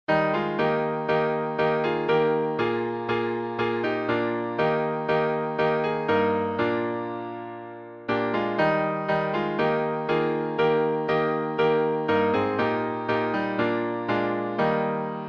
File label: Hymns